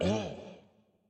Hurt; Dinkubus

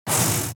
Boss Lite Hiss; The Binding of Isaac

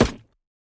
Weapon Generic

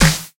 Snare

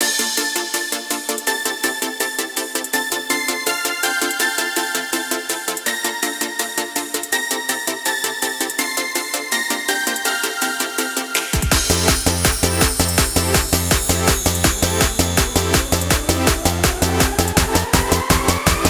Jingle bell

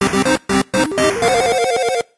Battle Start; Pro Wrestler Story (Android, iOS) (gamerip) (2024)

